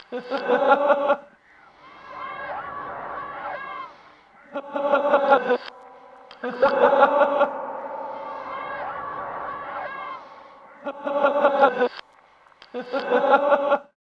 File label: Laugh; Laugh School; Zero-G - Ghost In The Machine